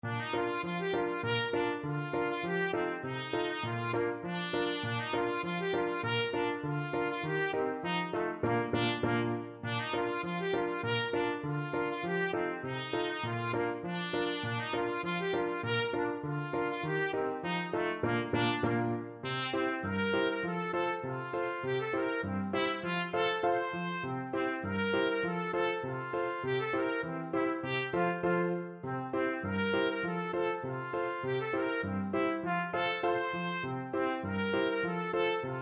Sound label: polka, trumpet; pincushion polka